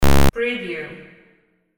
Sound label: beep